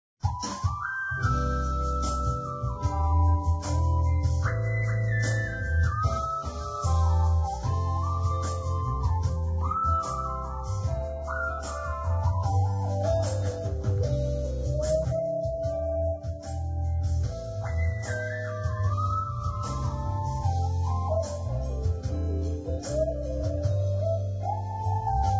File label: Keytar